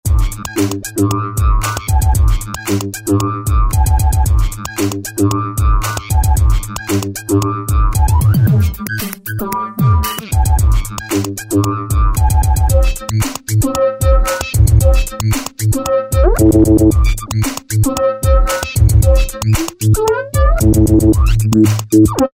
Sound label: Robot Hop, Roland V-Synth; Factory Patches